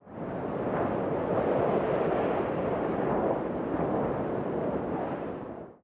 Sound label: ambient battle; World Of Warships